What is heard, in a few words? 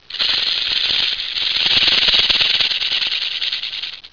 snake, animal